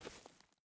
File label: footstep burlap stones walk; Rust